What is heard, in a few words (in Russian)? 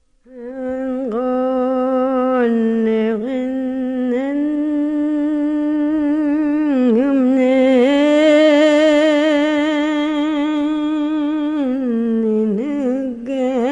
Кереки. Личная песня